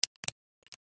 Plane Cessna 172, Plane Cessna 172 Skyhawk Interior Cockpit Seatbelt Buckle